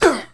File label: Male Hurt; Mars Sortie